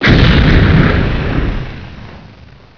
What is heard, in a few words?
Crash, Aircraft A320 321